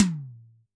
Tom, drum

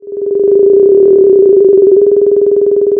pop, Sound of a phone ringing

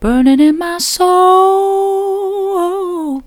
burning in my soul soft, vocal

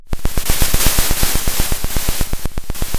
Sound of a drum roll

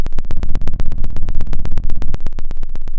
pop, Sound of a fire crackling

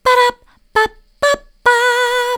bada bap, vocal

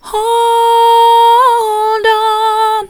hold on soft, vocal

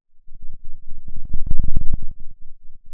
Sound of a thunderstorm, center

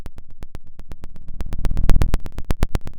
Sound of a fire crackling

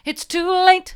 it's too late mid, vocal

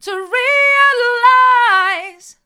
to realise hard, vocal

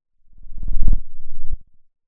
pop

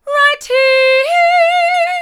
right here hard, vocal

